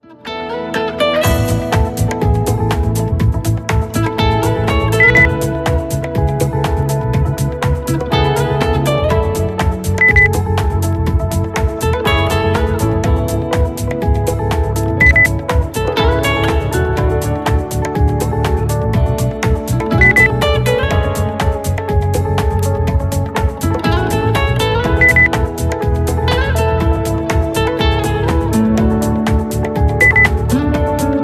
pop

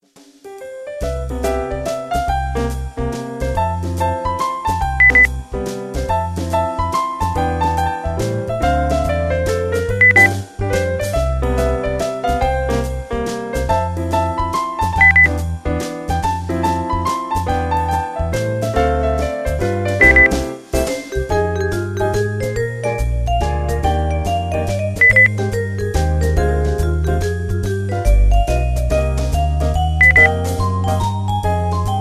jazz beep

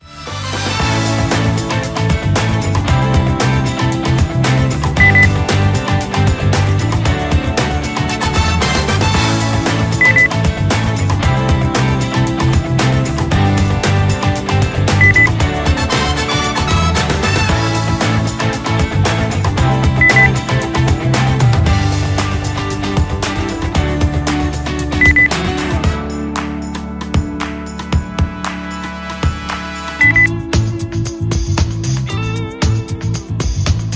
groovy beep

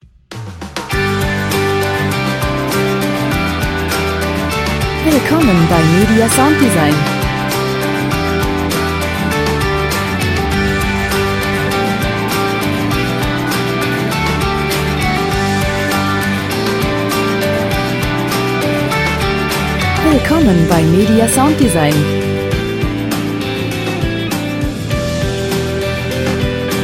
rock

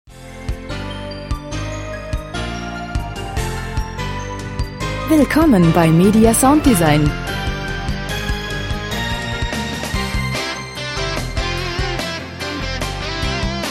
rock highway one

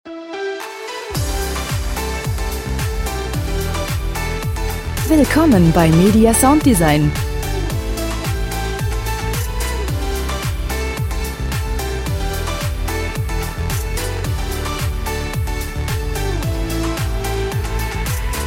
pop

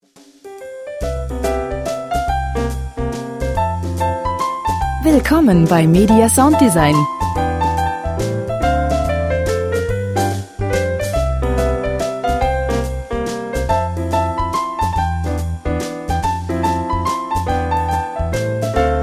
jazz

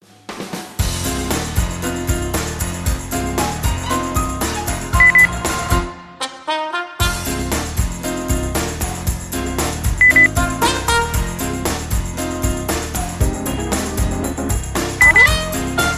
jazz contemporary view

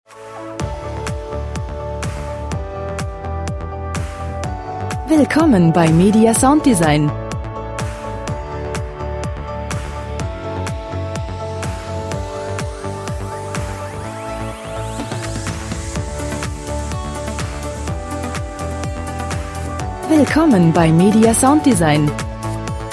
pop

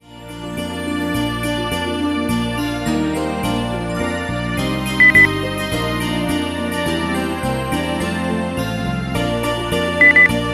jingle bells; weihnachten

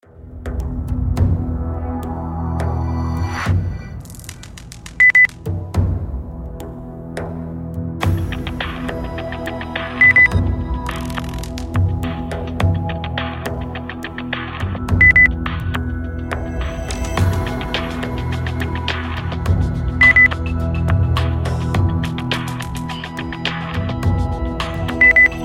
percussion energiefeld beep

